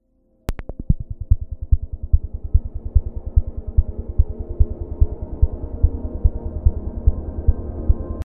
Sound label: website loop